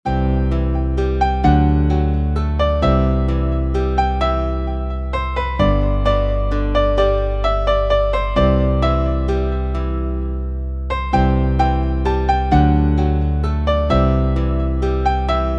Guitar, instrumental ringtones

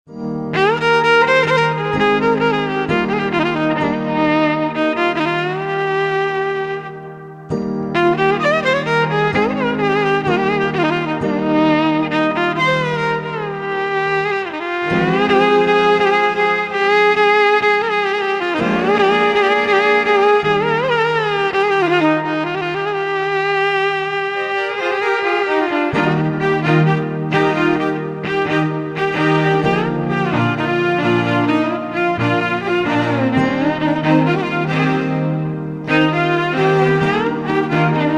Violin

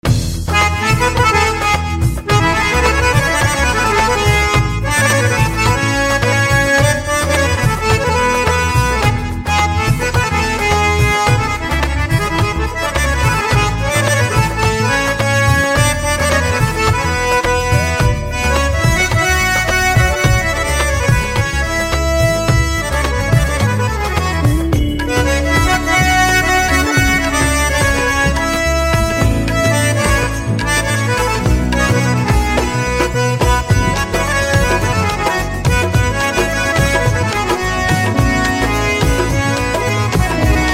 Harmonium Instrumental Ringtone

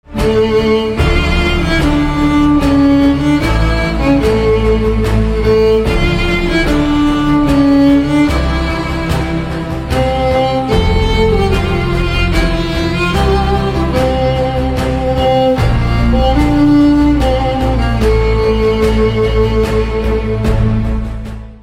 Violin, instrumental ringtones

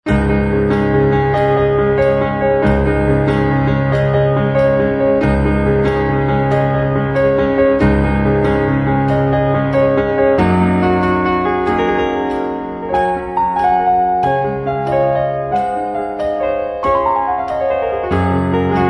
Piano

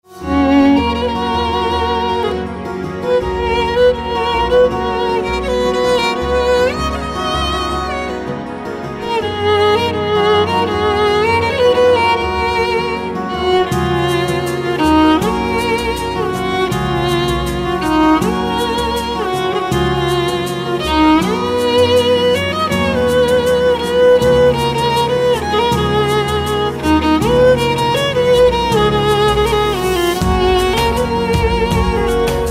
Violin, instrumental ringtones

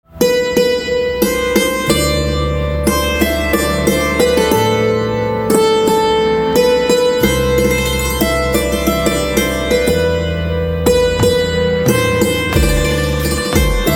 Santoor Instrumental Ringtone

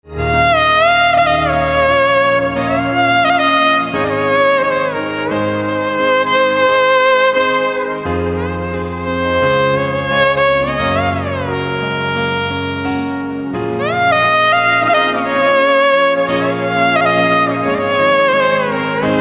Violin

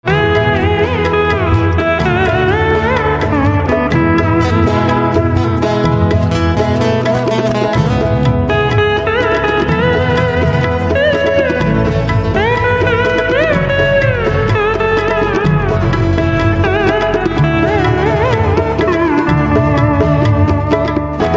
Veena, instrumental ringtones

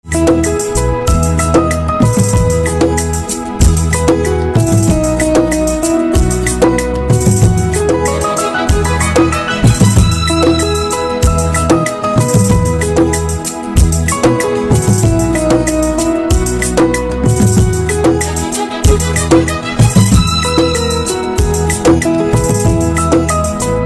Piano Instrumental Ringtone

